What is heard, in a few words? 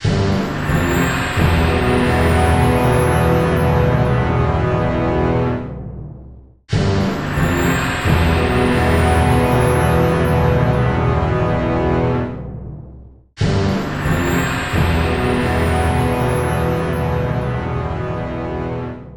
Fail; Dungeons & Dragons Daggerdale; XBLA; Xbox 360